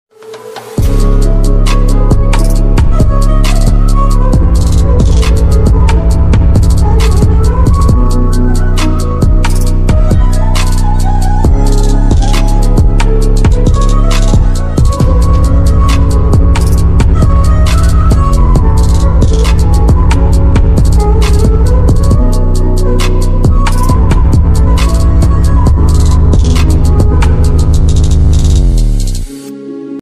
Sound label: tone - turkish